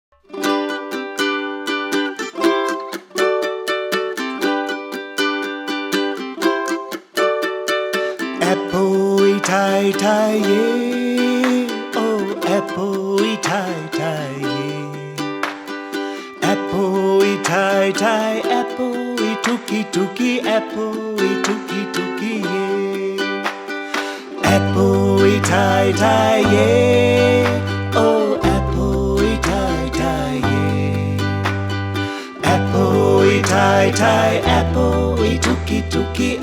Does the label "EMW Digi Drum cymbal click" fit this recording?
No